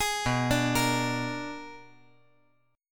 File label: B-Minor Major 13th